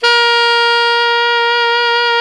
SAX ALTO MP, Alto Tube

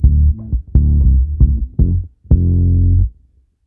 SNAIL FUNK D M, Bass, 76 BPM; Sampleheads; Ultra Freakin' Bass

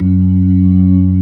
LOW SLOW F#2, ToneWheel Organ; E-MU Formula 4000 Series Vol. 3; Analog Odyssey

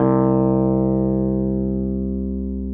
Wurlitz EP C2; Sonic Reality - Vintage Timetraveler

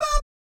BAP D5, Vocal Planet Jazz & FX; ILIO; JAZZCOMBO

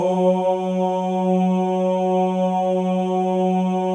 male ohs; Spectrasonics; Symphony of Voices

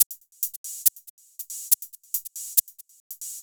808 Loop, Drums Beats

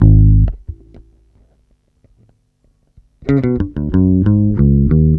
bass, Roots Funk; USB Soundscan vol.41; AKAI

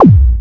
Dunk, Drums, Industrial

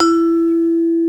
E3 vibe, vibraphone; InVision Interactive; Lightware VOL-1; Instruments & Percussions

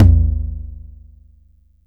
Bass Tom, Jomox Airbase99

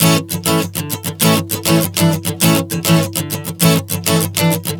Strum 100 A, One Chord Strumming 100 bpm

